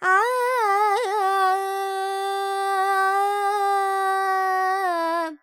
Panthuvarali, Carnatic Female, alaps, vocals, 100 G, dry; Sample Banks